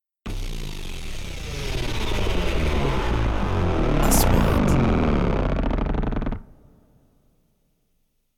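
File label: 3D SFX Trailer; مؤثرات تشويقية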